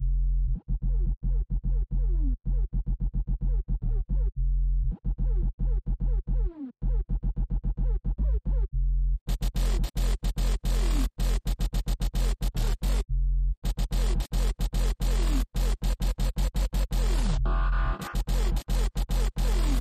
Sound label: House Synth 110bpm